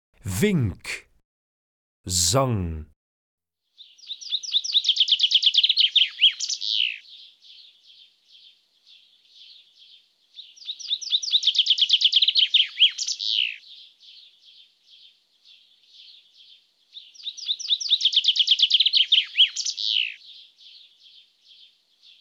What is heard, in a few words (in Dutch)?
Vink, Zangvogels; IVN